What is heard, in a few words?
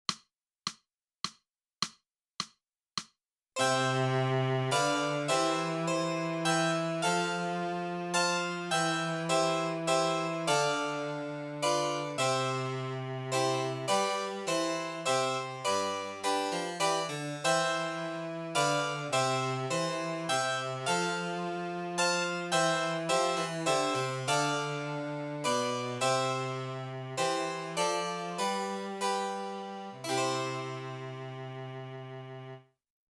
sax minuet